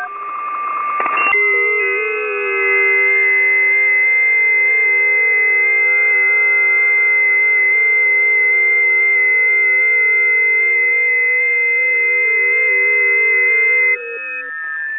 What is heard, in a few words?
20m busy, ft8; ft8 lib; rpitx; Karlis Goba